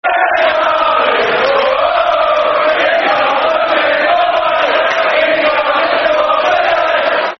Football Chants (Soccer Songs); Benjani; Portsmouth Pfc

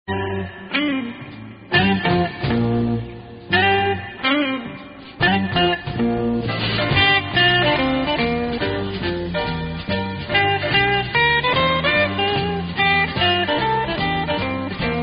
Instrumental; rush week

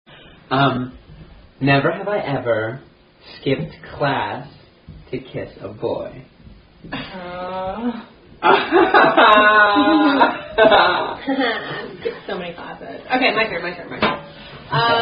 Drinking Game

